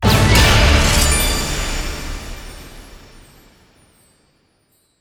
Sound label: medium Win; netent